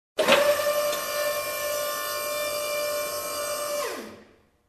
Hydraulic Machine running

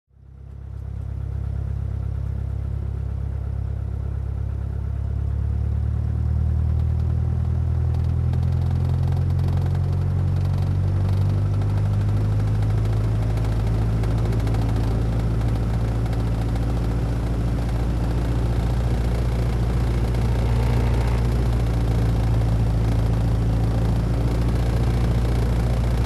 Light Aircraft Airscrew Rolling

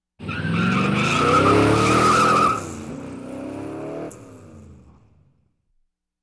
Squeaky Brakes and Car Vroom

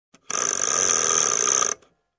Clock gear roll

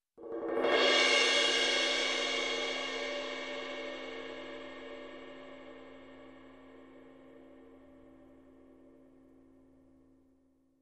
Cymbal Swell Short

